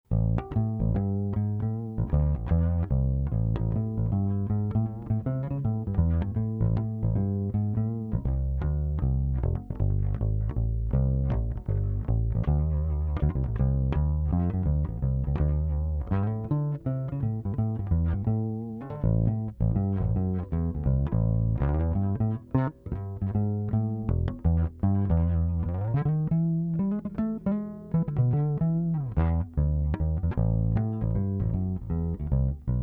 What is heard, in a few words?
Canna Bass Evo Pickup only; cannabass evo birdseye